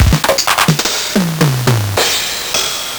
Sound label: Nintendo Gameboy LSDJ TR707